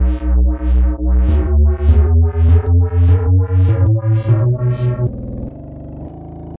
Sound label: F Blues scale